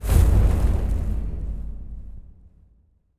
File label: power wheel open; gamerip